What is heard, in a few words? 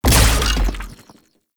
Shot; Warframe; Weapons; Corpus; Crp Freeze Ray; Tenet Glaxion